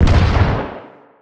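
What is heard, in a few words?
cannon fire distant; midnight guns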